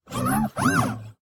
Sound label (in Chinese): 询问的哔哔声; 守望先锋; 堡垒